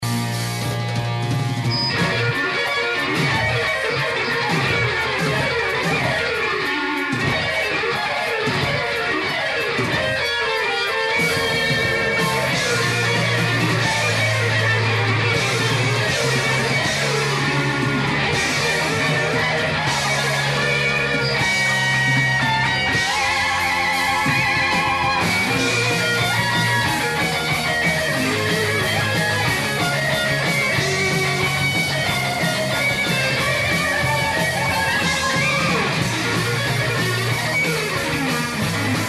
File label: hard driving rock guitar tune; g solo